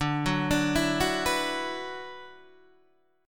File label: D 6th Add 9th D, Guitar, Open D